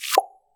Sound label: sent